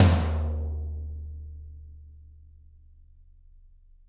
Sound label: Toms, Drums; Bob Clearmountain Drums I